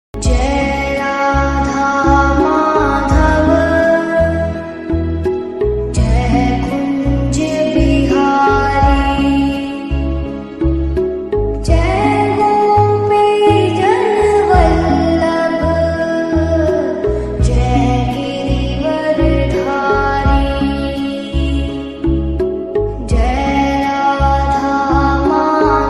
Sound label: jai radha madhav lofi version ringtone